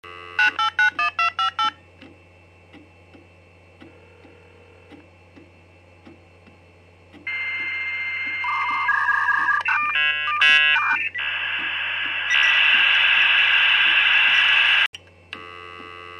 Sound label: modem; inbellen modem